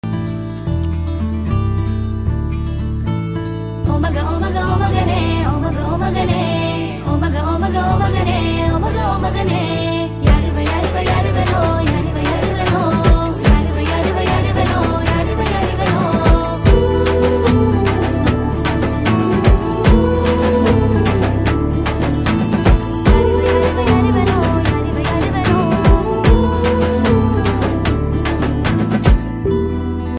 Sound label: bgm; aavasham